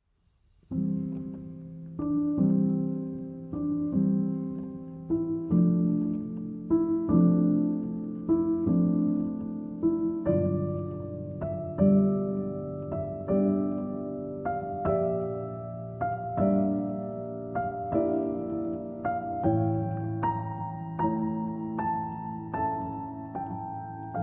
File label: Sonata for Solo Violin No. 3 in C Major - I. Adagio